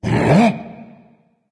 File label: surprise; smite; voicepack; kuzenbo